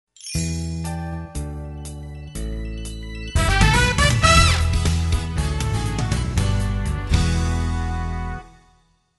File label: Liner - Bed